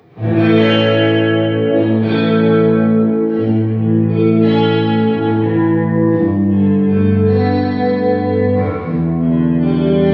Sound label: Reverb Kill Dry Swell; Prussian Blue